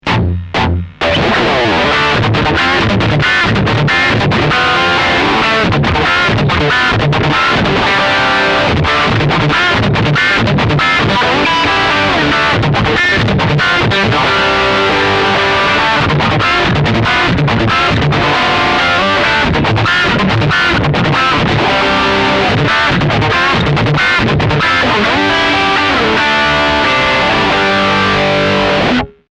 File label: Pedaltone